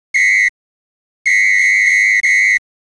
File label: police